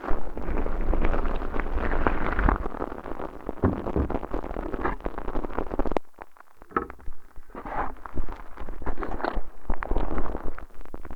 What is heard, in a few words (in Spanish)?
toma mate